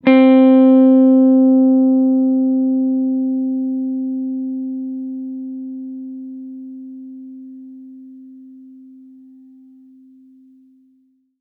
Long Guitar C3; Aubit; Awake Vol. 1 Serum Noises

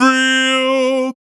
Noises Vocal Shot; Synthetic's Serum Collection; Production Master - Vital Future Bass 4

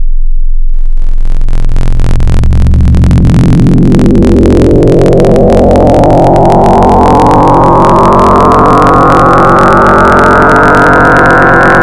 Sin Sin Mod, Xfer Serum Wavetables; Synthetic's Serum Collection; Cymatics - Nuclear for Xfer Serum Wavetables

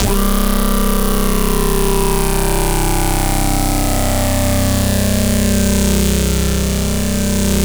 Brainstorm Lead; Cymatics; Noise Osc Samples for Serum

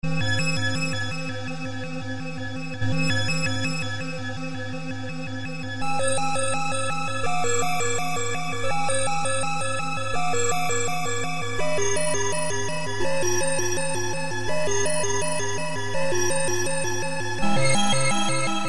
8bit ghost haunting my house

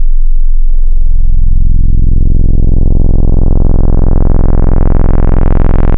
Basics Sub Sine; Synthetic's Serum Collection; Echo Sound Works Core Tables

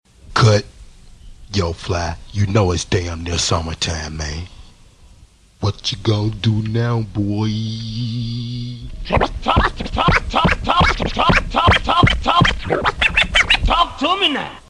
Outro

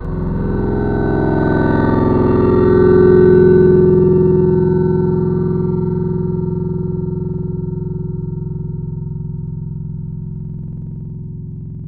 Tambura; Instruments; World; Synthetic's Serum Collection; Echo Sound Works Core Tables

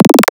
Kicking II; Synthetic's Serum Collection; Cymatics - Nuclear for Xfer Serum Wavetables

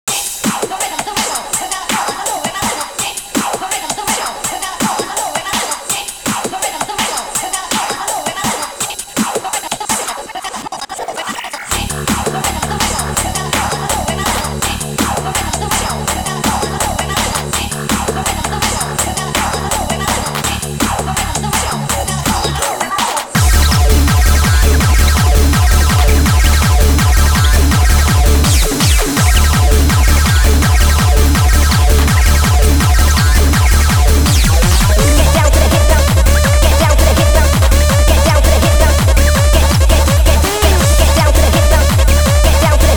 noise wave ride, lolicore

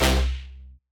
Serum SYN Brass Drop; Synthetic's Serum Collection

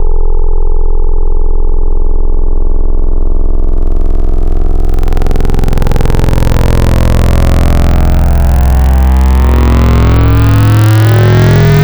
Square Squash, Serum Wavetables; Synthetic's Serum Collection; Cymatics - Nuclear for Xfer Serum Wavetables

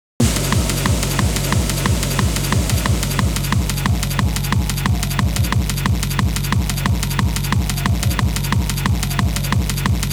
lolicore; Comiket; SOLIDBOX RECORDS

